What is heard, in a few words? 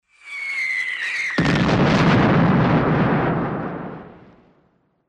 Whistle and projectile explosion; explosions